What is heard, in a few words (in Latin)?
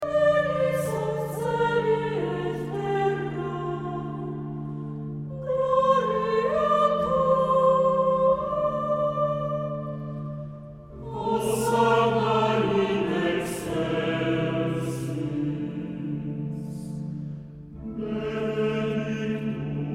Sanctus Benedictus